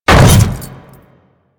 Hammer Boss Land Default, Footsteps; Warframe; Grineer

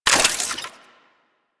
Modular Secondary Handle Slow Reload, Guns; SU Modular Guns; Reloads

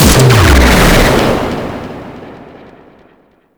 Shot; Warframe; Weapons; Archwing; Powers; Archwing Big Boy

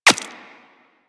Bullet Impact Flesh; Warframe; Weapons

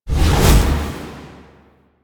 Sundering Dissipation Cast; Warframe; Focus; Unairu

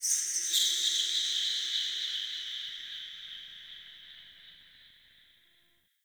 Under City High Creature Echo

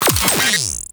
Charge Gun Empty; Crp Charge Gun

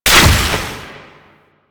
Orb Destroy; Warframe; Duviri; Conservation

